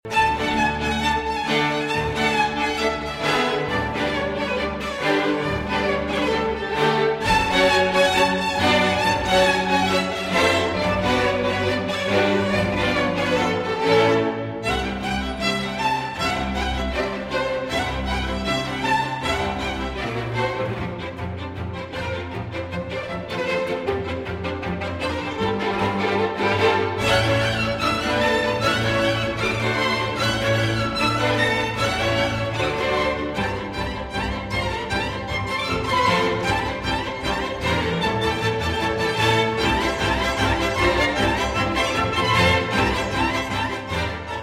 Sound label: Romanian Folk Dances; 6 Romanian Folk Dances, BB 68, Sz. 56; Bartok; No. 6, Maru